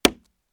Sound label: Ink